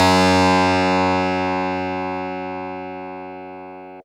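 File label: Fuzz Clav DX100 F#0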